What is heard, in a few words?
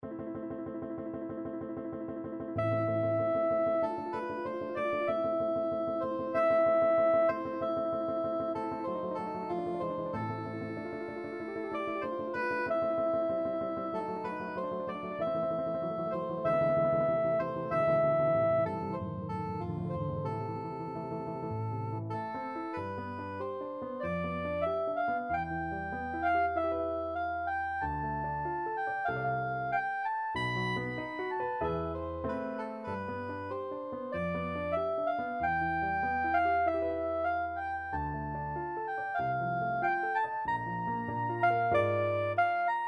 scene finale, soprano saxophone